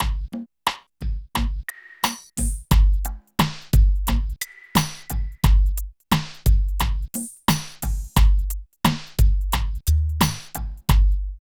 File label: DRUM LP; East West - Phat & Phunky